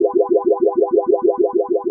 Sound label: HOUSE FX 125